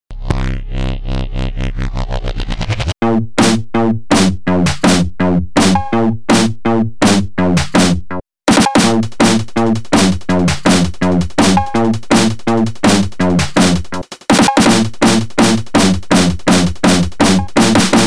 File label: polyend tracker; automotive electrician